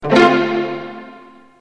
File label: Short SMS, ringtones